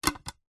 household plastic plate set down on another gently